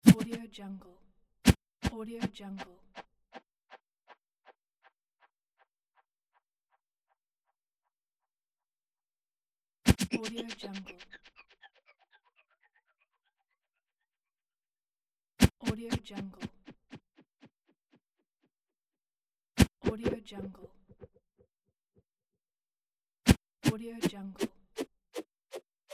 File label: swooshes transitions; dmnkbrn